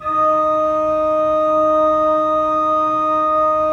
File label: 3D Pipe Mix Celeste, 3D Organs